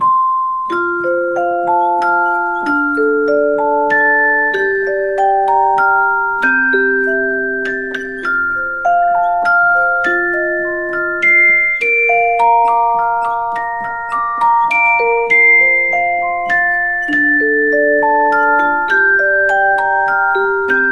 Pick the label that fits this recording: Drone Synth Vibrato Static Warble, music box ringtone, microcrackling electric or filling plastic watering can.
music box ringtone